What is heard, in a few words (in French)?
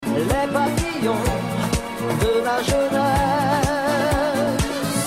Accord de tonalité Arrêt sur F7